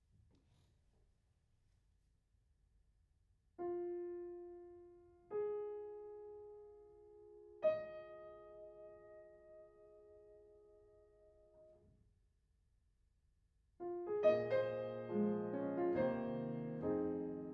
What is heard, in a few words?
Piano Room